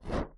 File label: whoosh; Bunnicula - Juicy Bites